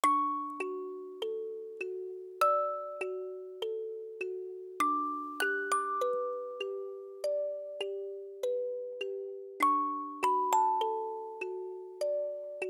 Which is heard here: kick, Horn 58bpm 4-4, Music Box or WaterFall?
Music Box